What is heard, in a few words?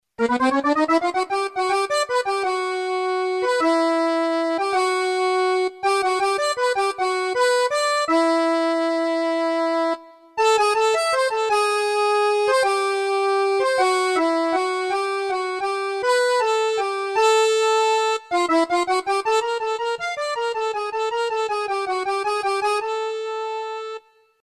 fisa, Korg N1R; fisa accordion; Lupin